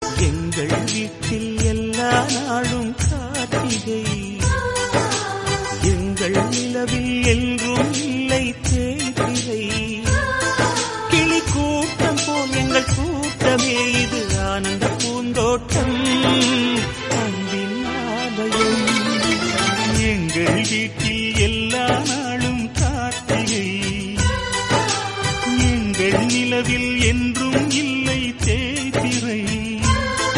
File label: family song